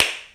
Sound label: pumpkin break